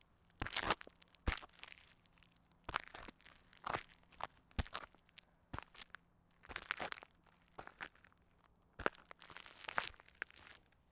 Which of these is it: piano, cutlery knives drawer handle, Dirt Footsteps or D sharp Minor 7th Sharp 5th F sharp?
Dirt Footsteps